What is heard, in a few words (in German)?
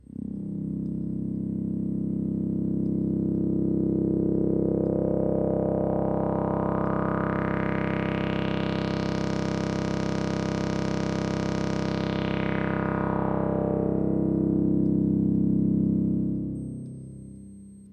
filterspiel, Trautonium